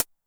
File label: closed hat Short Decay, 909; classic machines; 909mod